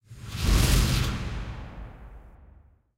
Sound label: killscene xray on; tanks; War Thunder